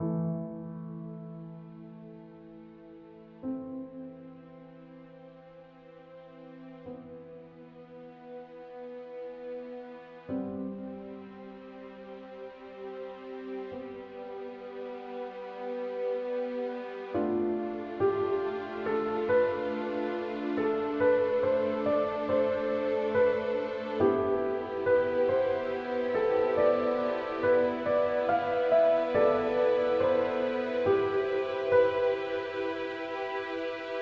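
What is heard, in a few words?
Rain, cinematic action drums music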